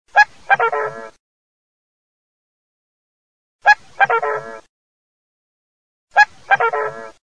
OCA CIGNO

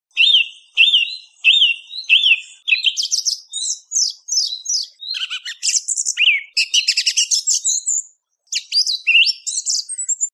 Tordo bottaccio

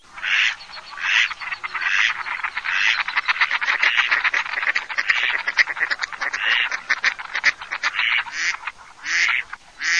GERMANO

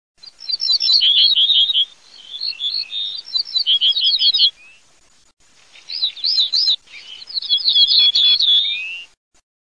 ortolano

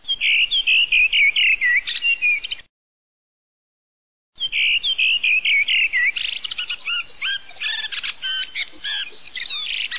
SASSELLO

